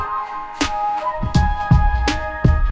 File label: Japanese flute, lofi; musicgen